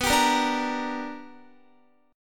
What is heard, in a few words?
B-Minor Major 7th Sharp 5th B down Guitar; BmM7#5 strummed